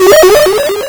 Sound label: Failure, Metronome; Audition